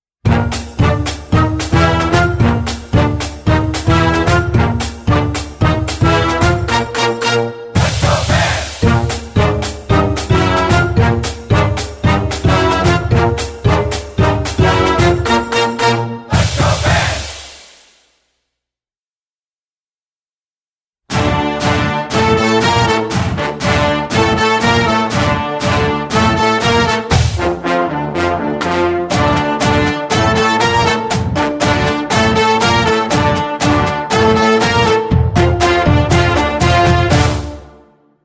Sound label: PEP cheer riffs, Marching